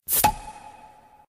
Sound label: Cork, notifications; Doov A8